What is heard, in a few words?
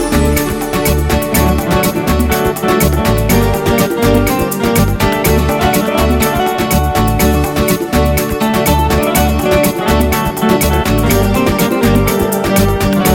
Hot and Smooth Guaguanco